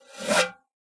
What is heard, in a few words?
OXACA SKIN, World & Orchestral Percussion; ILIO